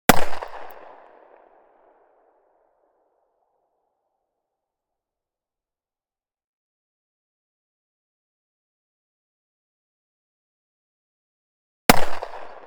Smith & Wesson 60 FIRING 3m Behind Left, Smith & Wesson .38 Chiefs Special Model 60; Pole Position